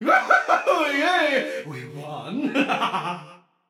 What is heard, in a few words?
yay, voicepack; smite; jorm